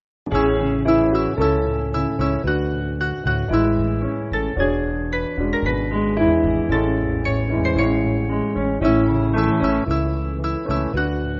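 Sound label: Piano, Music Backing; Jones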